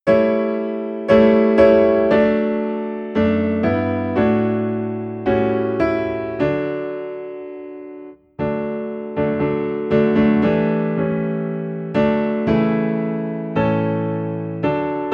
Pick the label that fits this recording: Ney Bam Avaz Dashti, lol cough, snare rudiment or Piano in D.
Piano in D